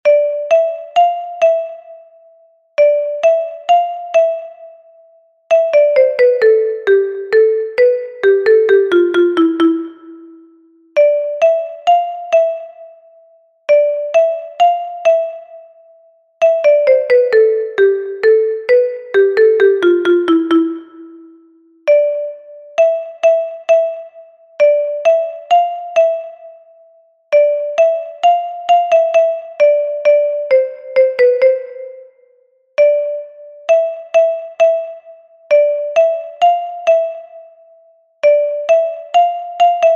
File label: kalimba; Fikrimin Ince Gulu